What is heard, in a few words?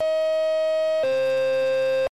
alert tone; fdny